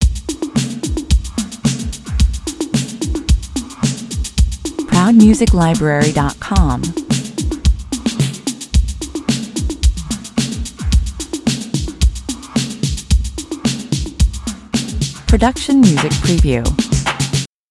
(loop drums)

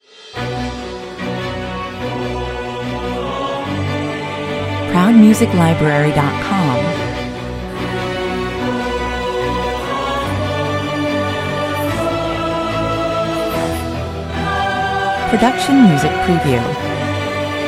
no percussion

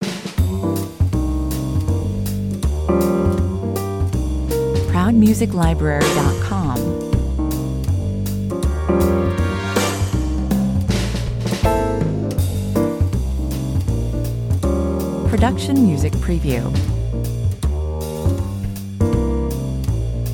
toe tapper (60s underscore 160 bpm; Sitting on a stool in a smoke-filled bar and tapping with your toes, a shorter version

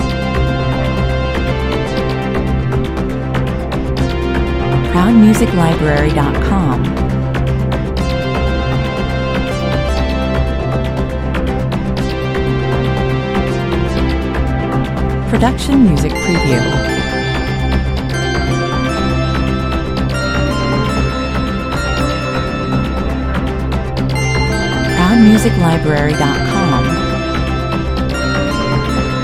goa techno loop

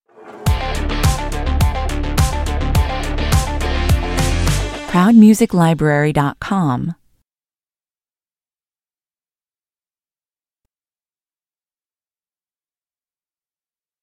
stinger

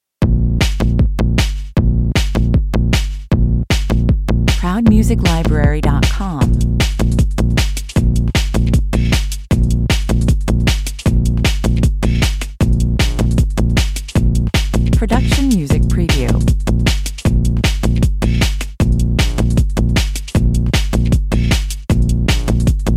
ghetto bass 155 bpm

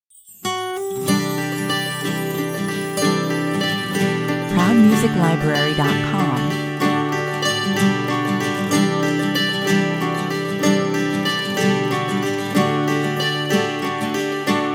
short acoustic version 94 bpm

